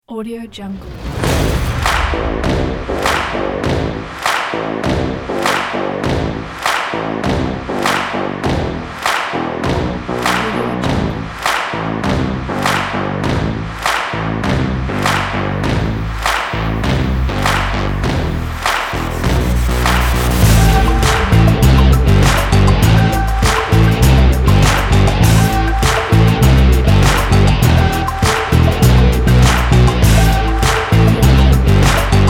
stylish sports rock

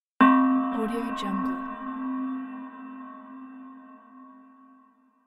bells sound

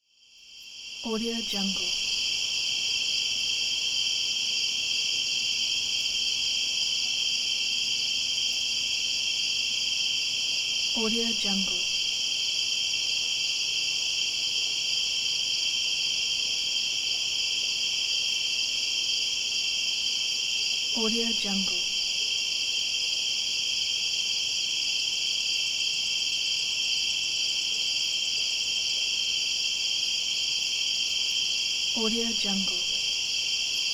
night forest insect ambiance

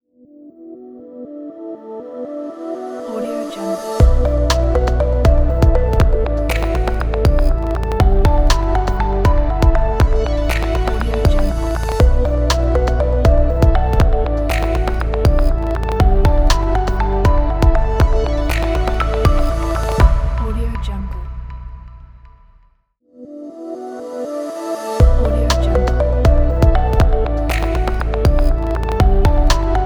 digital minimal modern logo

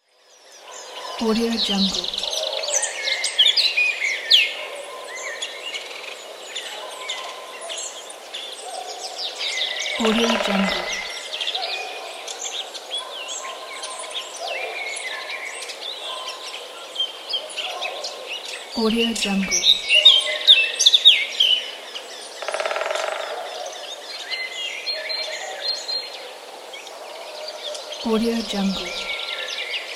woodpecker and birds in forest near village

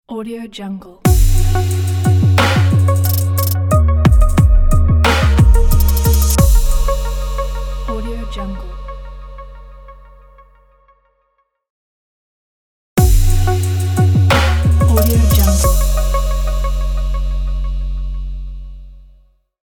trap logo

